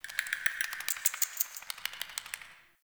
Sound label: Cave Shrine Critter, ambience; Midrath Island